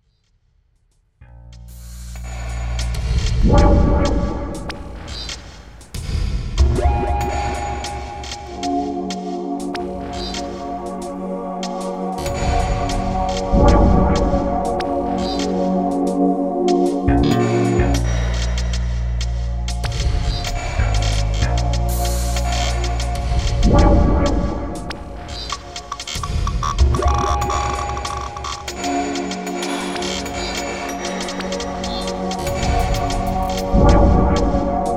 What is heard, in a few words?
Constant Background Noise